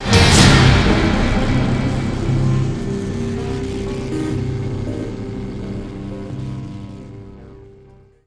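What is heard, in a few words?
stinger drums; transformers war for cybertron gamerip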